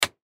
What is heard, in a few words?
Ambulance Switch, Ambulance Switch Click Off Interior; Emergency; Emergency Vehicle Sound Effects